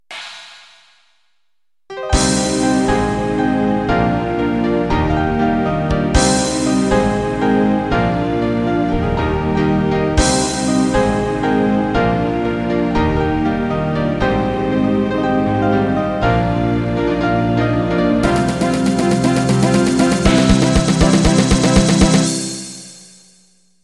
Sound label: House Intro